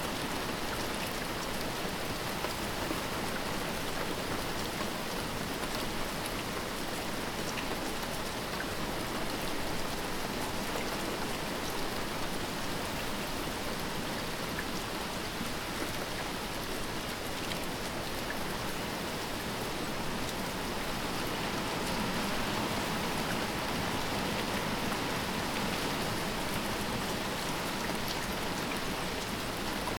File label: outdoor town heavyrain